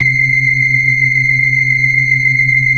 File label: 60 BLOOD L 2, ORGAN; Keith Emerson Signature Series